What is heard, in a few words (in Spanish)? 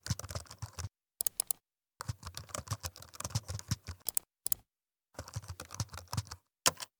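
TECLAS RATON LARGO, keys mouse long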